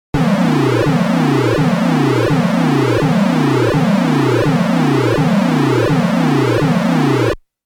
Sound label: Warning; Darius 30th Anniversary Box